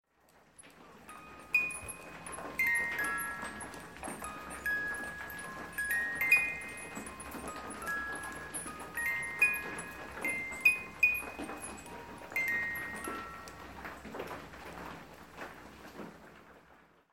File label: Singing